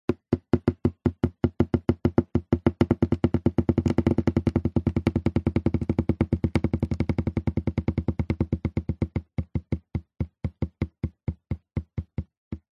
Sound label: nature water slow then fast on thick cardboard